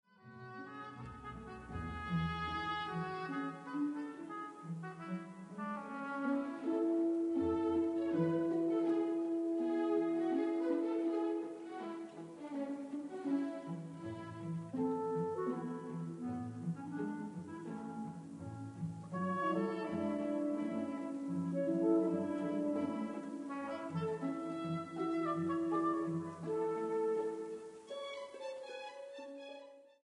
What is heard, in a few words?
Symphony in D minor, Tempo di menuetto